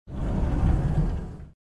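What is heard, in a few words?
door sounds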